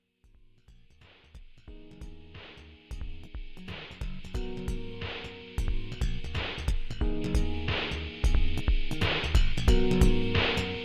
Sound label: acid loop